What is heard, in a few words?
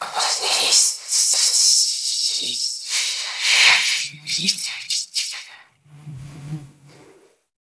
jingle